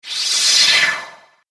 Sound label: Fantasy Spell, magic sound effect